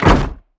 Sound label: Hinged Door Close Strong; D4DJ Groovy Mix; Android, iOS, Mobile